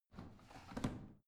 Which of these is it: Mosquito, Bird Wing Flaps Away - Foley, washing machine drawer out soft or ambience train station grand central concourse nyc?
washing machine drawer out soft